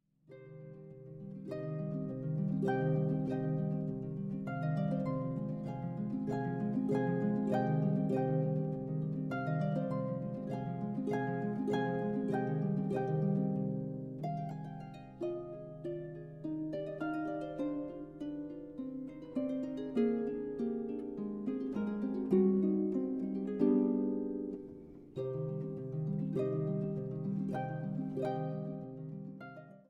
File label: harp allegro from sonatine; Sonatine II; Naderman